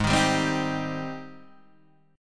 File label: Ab Major 7th Ab down Guitar DADGAD